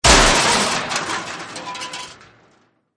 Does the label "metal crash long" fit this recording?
Yes